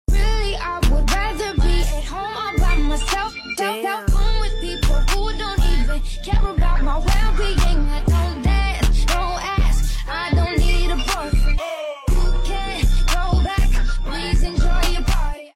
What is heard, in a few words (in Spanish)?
musica; lamine yamal